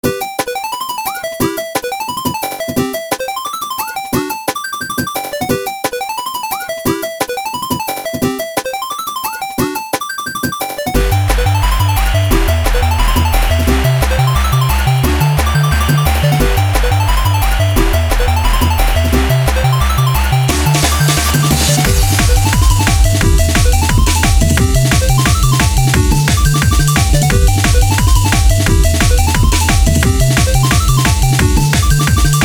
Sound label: Sine Waves